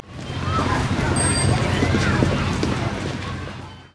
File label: town noise